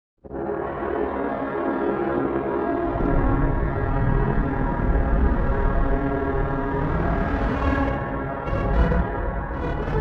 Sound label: classica